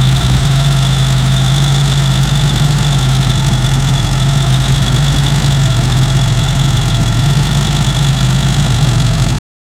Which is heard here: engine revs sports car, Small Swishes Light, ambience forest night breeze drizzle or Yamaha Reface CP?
engine revs sports car